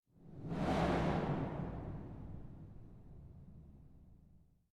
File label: muscle car distant rev short